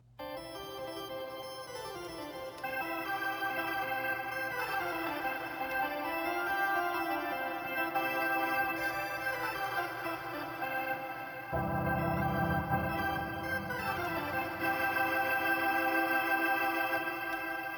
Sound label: organ; Cryostasis